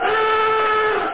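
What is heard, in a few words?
Alarm Siren; freetrading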